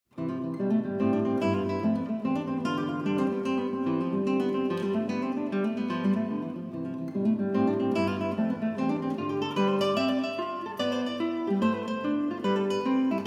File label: contrapuntal etude; solo; six contrapuntal